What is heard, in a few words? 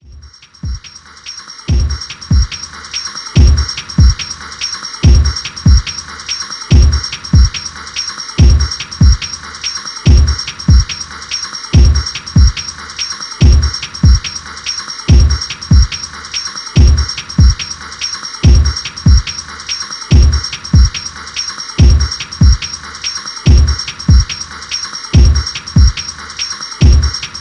Techno Club; postal 1997